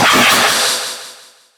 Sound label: chains; Hazama